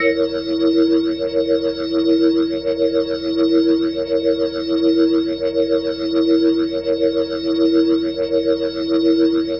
Glowing Organ F#7; Dominant 7; The Vitale Organ

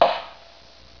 SNARE

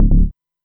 bass, dance

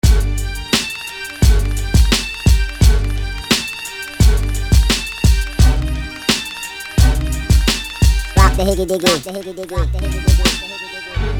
instrumental

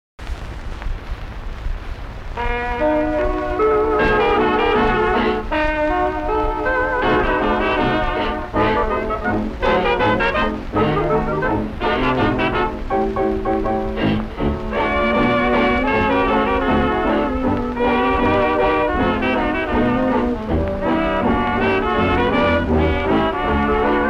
Orchestra

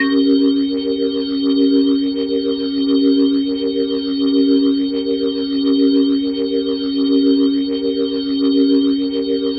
Glowing Organ D#7; Dominant 7; The Vitale Organ

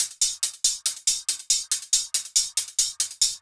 Hi Hat Loop, Dance, Beats

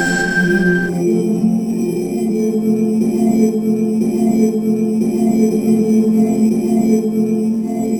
Modem Orchestra, Textures

